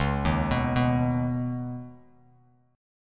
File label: Db 7th Suspended 2nd, Bass Standard; Db7sus2 arpeggiated; JGuitar